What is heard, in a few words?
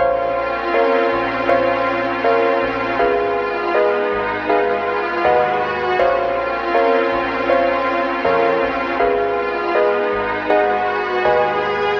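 piano and strings, Loops, Sad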